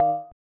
BELL

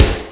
soft hit clap

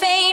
vocal

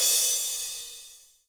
drum hit finish

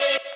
saw

